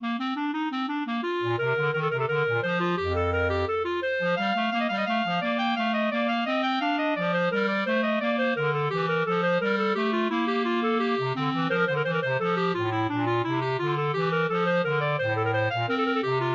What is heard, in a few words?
invention for b flat bass clarinet; Johann Sebastian Bach